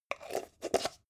jar plastic midsize close

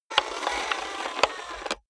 robot movement slow

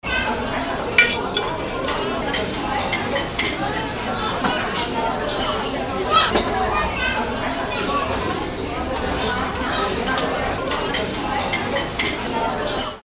restaurant in china

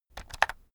keyboard external shortcut medium

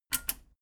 metal button radio click power on fast

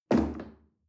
chair wood place medium

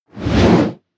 whoosh

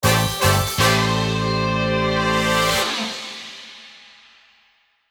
jazzy brass logo

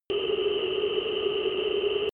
drone spaceship screech

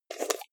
jar plastic midsize open fast

